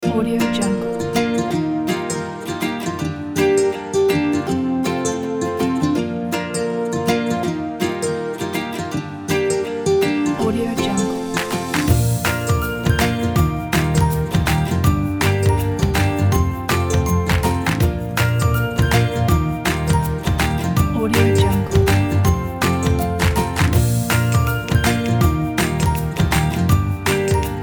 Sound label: happy clapping ukulele; chameleonlab